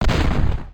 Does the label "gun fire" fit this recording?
Yes